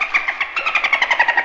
dolphin appears; soundspvz

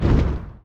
ignite; soundspvz

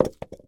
ceramic; soundspvz

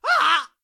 jack surprise; soundspvz

